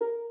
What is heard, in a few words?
Harp